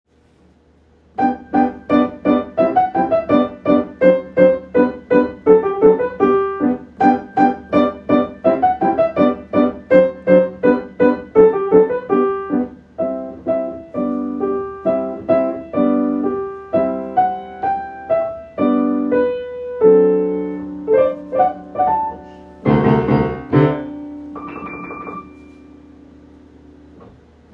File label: Allegro with cadenza